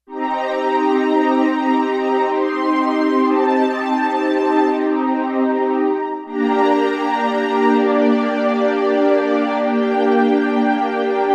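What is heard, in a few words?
Strings Syn Str, synth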